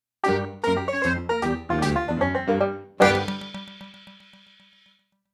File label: Cowboy Victory